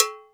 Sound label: MAMBO BEL, Korg EMX-1, Drum Machines